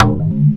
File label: XYLOFOAM HIT; PERCUSSIVE; Rarefaction; Poke in The Ear With A Sharp Stick